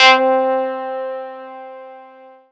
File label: BUZ, synthesizer bass; VFX-SD